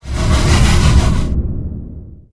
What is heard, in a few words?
Ability Cast